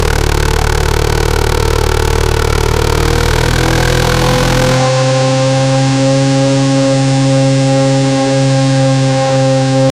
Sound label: create a v16 engine for, motorsport